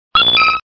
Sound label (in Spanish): Grito de Horsea